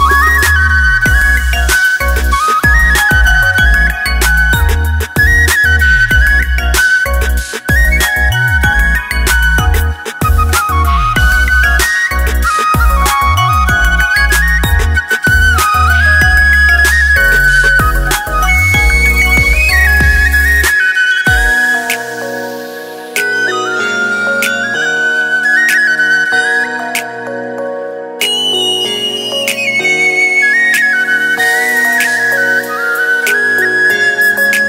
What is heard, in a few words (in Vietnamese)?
Sáo Trúc